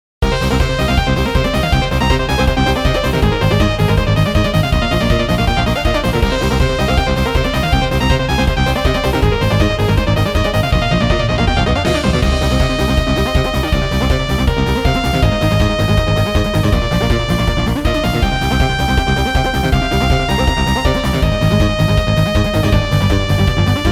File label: dungeon Battle